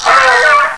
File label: pit worm flinch